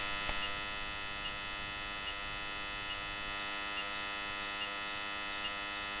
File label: Stumpffia spandei